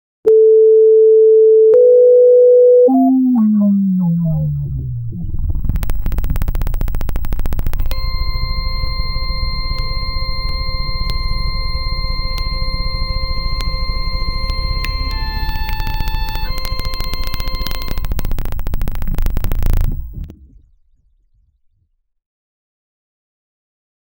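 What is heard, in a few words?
the sound of the beginning, game show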